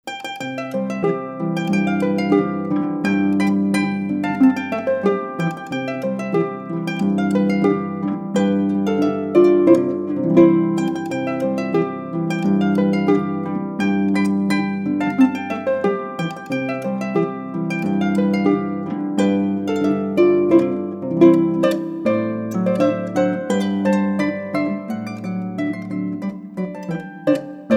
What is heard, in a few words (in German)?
Boarischer